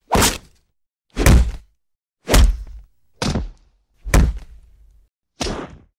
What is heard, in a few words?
punch hit tangan